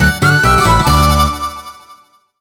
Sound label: WIN